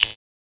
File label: ZAP; Rarefaction; Poke in The Ear With A Sharp Stick